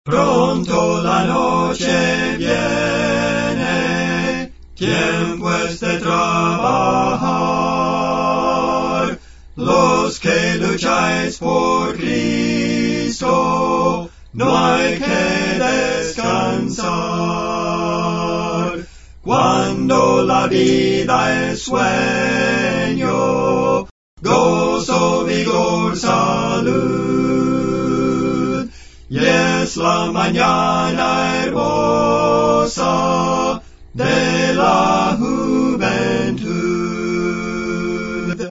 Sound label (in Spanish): 4 Voces, Cantos Espirituales; Las cuatro voces